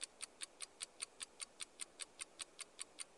Metronome

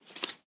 wood tile drop

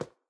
land stone

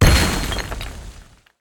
stamp wild appear; jumanji

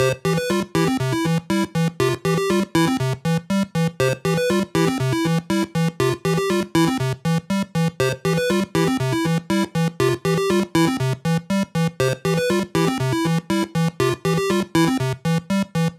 Background Music

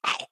zombie bite

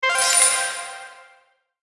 won badge

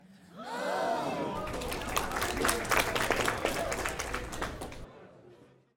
crowd Reaction guild small Reveal; spellbound

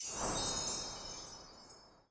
balloon achieve bonus

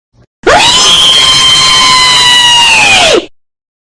REEEEEE

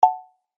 Chat Sent

